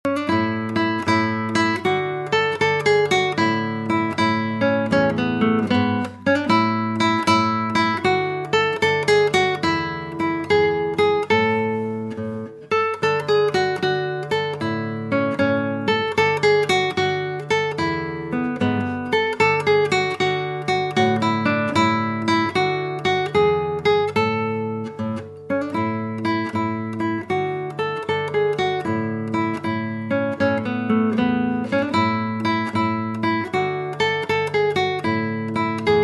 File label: irish dance